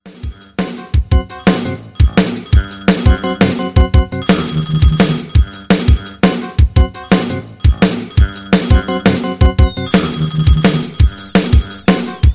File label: Wistle New Nokia